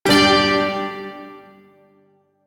Shawzin Chromatic Chord; E; Warframe; Duviri Shawzin

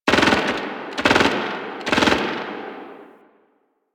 Distant Gunfire; Warframe; Ambience; Nineteen Ninety Nine

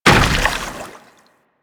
Combined Step Water, Footsteps; Enemies; Warframe

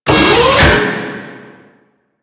Shipyards Robot Arm Short Move; Ambience; Props; Warframe; Grineer Shipyards

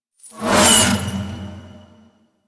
Proj Whiz By; Warframe; Cephalon Weave; Weave Boss

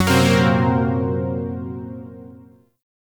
Shawzin Blues Chord; Zariman Shawzin

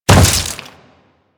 Step Flesh, Footsteps; Enemies; Warframe; Grineer

